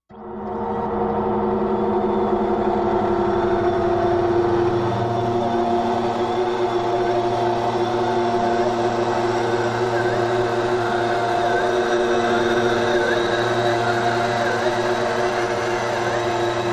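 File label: Titanium Drone Elect Hum, industrial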